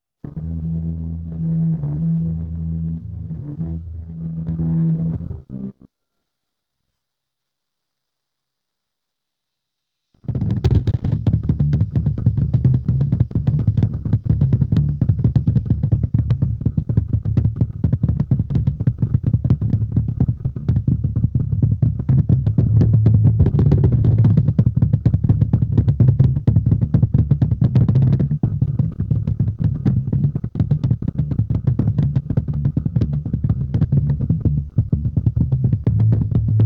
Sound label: bass